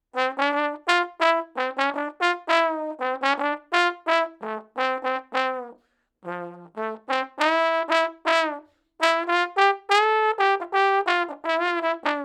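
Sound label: trombone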